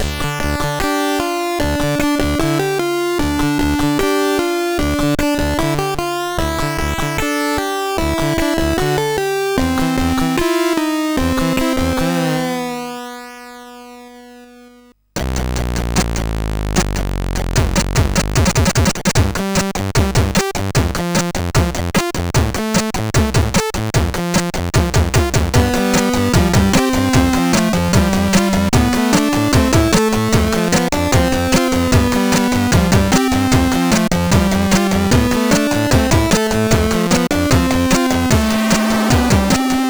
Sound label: Motorbike Blues sid 8580; CSG8580R5; Dexter